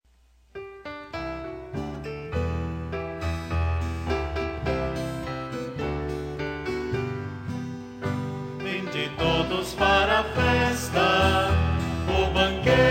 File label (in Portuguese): Hino Eucaristico; Corpus Christi